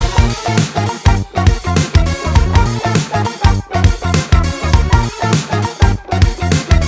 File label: BGM; Dharala Prabhu; Tamil